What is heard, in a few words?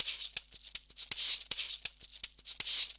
Cabasa 160bpm, African Drums, Extra Percussion; Quazibeat; African Secret